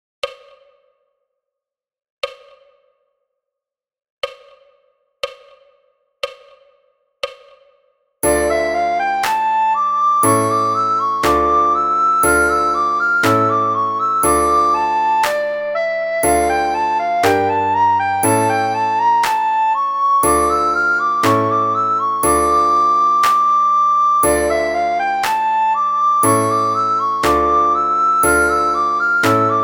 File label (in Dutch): sopraan snel tempo, blokfluit; Flierefluiter 6